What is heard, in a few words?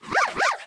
Small Chomp Bark